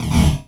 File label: Criket Metal, Esoterik Beatz; Criket FX; Cricket